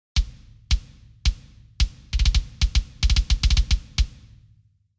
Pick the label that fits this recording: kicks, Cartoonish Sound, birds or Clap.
kicks